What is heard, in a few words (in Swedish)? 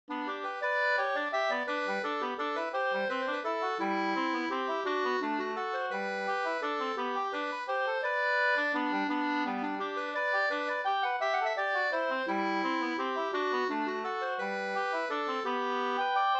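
vals, two-part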